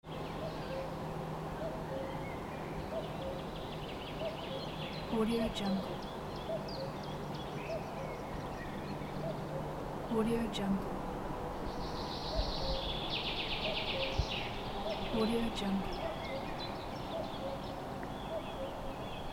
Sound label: suburban forest ambience